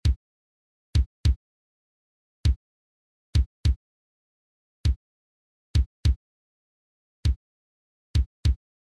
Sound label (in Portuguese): Toque - Bumbo; fanfarra